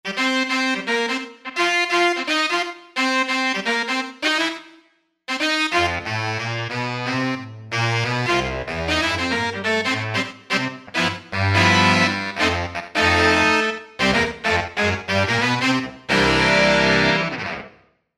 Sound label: LA Saxs